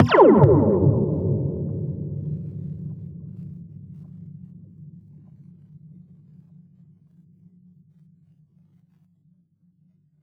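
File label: slinky impulse response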